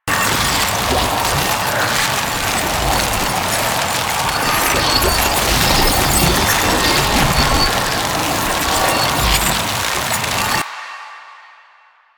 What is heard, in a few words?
Verglas Prime Freeze Ray Loop, weapons; DE; Warframe; Sentinel